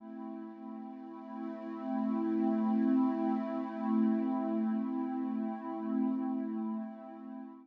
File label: SYNTH PAD ETHNO 125 A, Sphere